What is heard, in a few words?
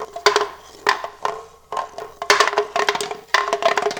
Small Bones Roll